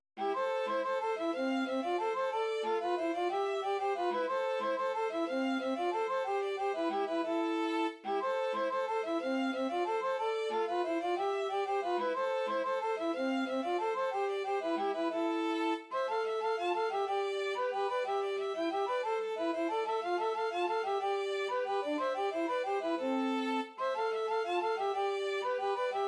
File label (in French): Bransle De La Fosse Aux Loups